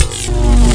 zap

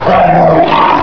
attack; hungerhound

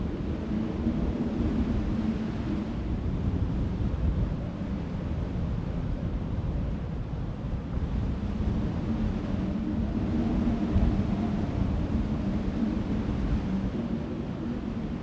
wind; de dust2 2020

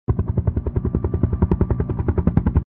Heli Distant; docks

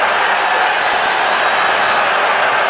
Crowd

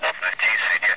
radio

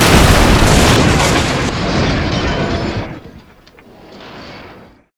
train crash wall

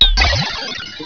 action

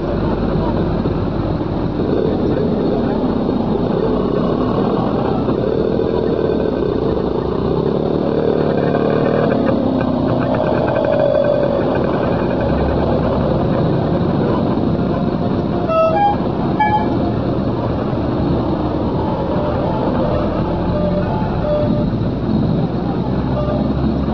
traffic

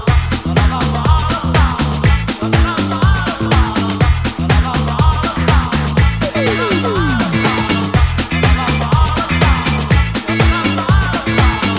theme

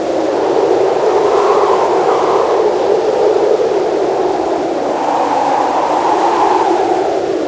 storm

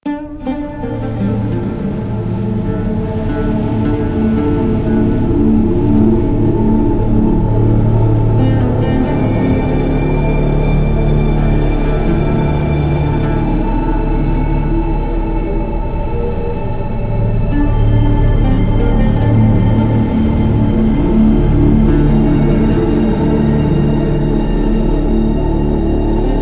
sand intro; samoa

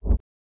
fan

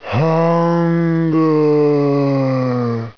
zombie; de compclub

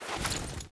bazooka deploy; svencoop; ww2projekt

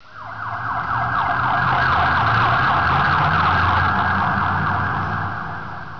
siren raid

